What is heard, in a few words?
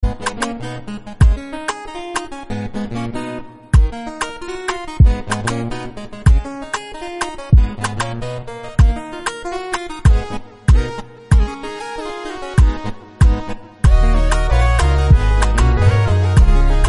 instrumental song